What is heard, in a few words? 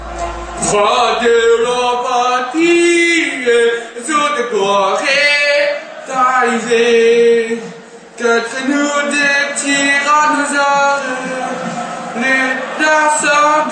badly sung la marseillaise french anthem; VOCAL ACAPELLAS